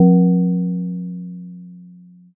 gong

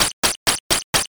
flutter kick

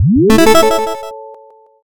boot

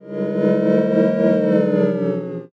chatter

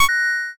judge perfect

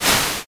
swoosh

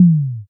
tom

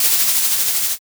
balloon inflate

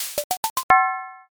transform mech

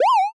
punyon

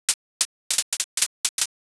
sparse clap

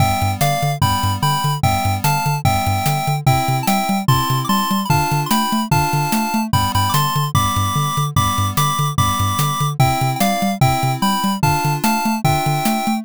bgm street

